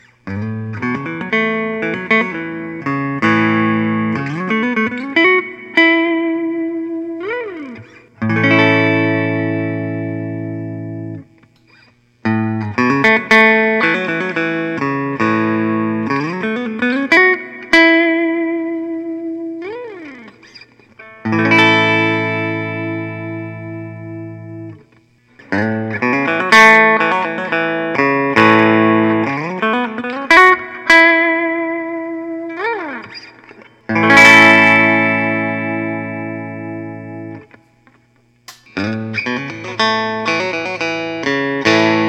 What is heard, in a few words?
paul reed smith mccarty 594 hollow